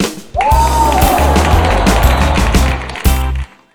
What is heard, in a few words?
Correct Wrong; Dinox; Wii U